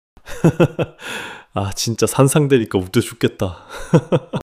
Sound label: laughter